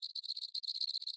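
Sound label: Cicada Loop Reverse; Void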